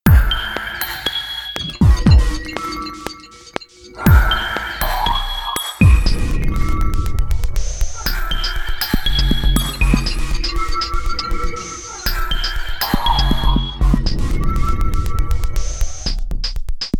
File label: ELECTRONIC darkly; Nokia